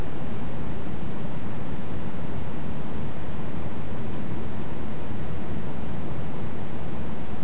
thrush sp 7.5-9.2kHz; nocturnal flight call project in beijing autumn 2021

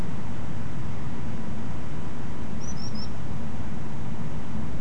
Common Sandpiper, nocturnal flight call; nocturnal flight calls (NFCs)

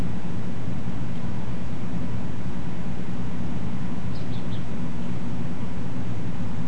Richards or Blyths Pipit calls; nocturnal flight call project in beijing autumn 2021

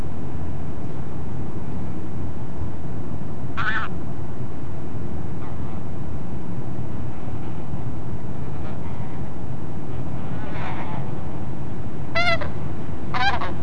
UNID goose sp prob Tundra Bean Goose series; nocturnal flight call; Beijing

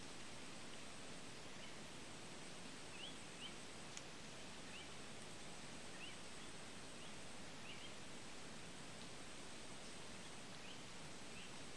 pacific golden plover, nocturnal flight call; Lingshan; Beijing

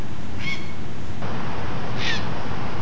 Grey Heron

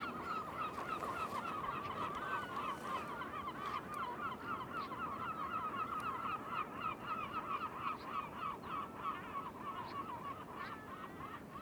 Mongolian Gulls calling Shahe Reservoir; Beijing

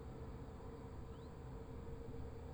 common rosefinch, night flight calls in beijing

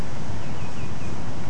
Common Greenshank, nocturnal flight call; Beijing; nocturnal flight calls (NFCs)

